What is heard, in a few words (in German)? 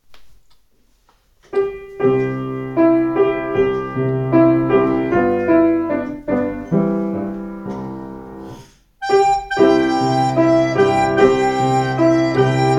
ihr kinderlein kommet instrumental; engelschor lieder